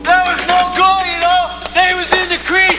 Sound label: crease